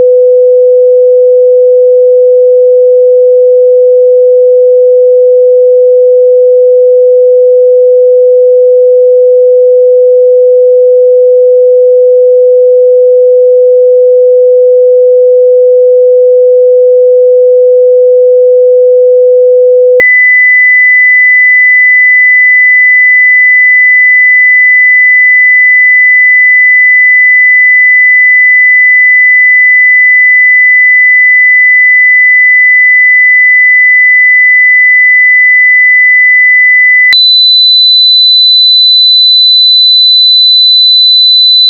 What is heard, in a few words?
tone sig test